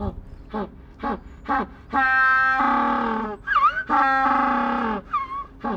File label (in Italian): Vocalizzazione, pinguini